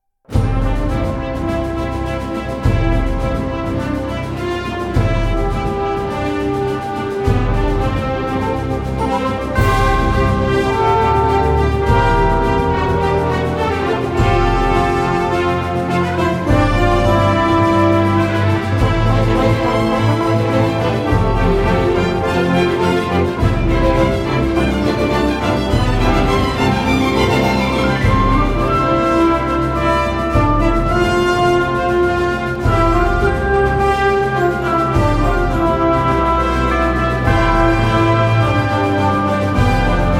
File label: National Anthem Full Orchestral Instrumental